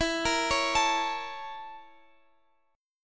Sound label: Bb Minor Major 7th Flat 5th, E